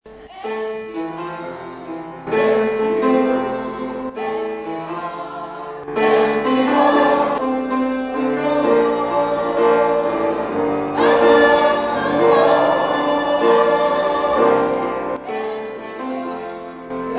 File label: tenor